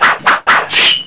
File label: knife